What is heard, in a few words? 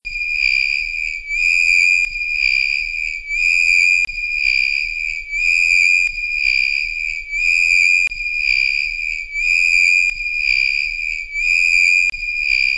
blackboard chalk